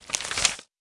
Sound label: paper; soundscrate